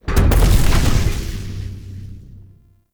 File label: Ammo Crate Destroy; Objects